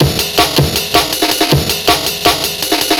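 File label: break 160; lachesis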